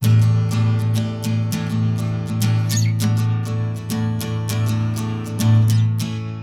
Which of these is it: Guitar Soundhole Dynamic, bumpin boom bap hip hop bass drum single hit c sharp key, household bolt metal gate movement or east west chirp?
Guitar Soundhole Dynamic